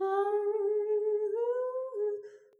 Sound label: Soft Soulfull Solo